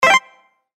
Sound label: flag